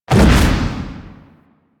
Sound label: Wraith Reaper Jump; Warframe